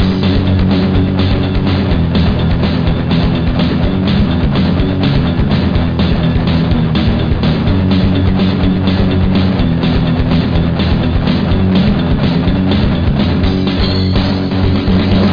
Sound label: rock